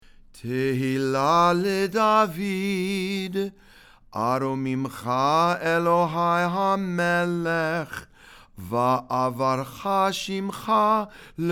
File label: ashrei read and sung